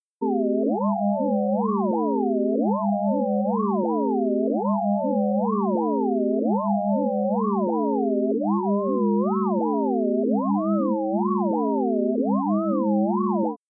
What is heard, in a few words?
Five Sines; Powers To Freqs